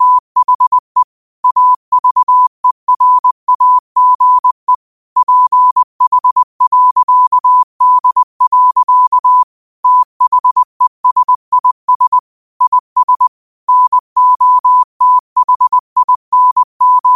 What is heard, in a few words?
5 wpm, morse